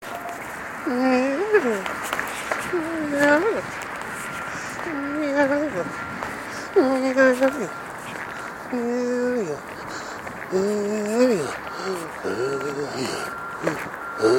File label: vocal improv; hampson street